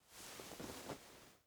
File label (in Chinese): 钻出来